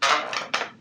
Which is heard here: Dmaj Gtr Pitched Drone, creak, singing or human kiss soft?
creak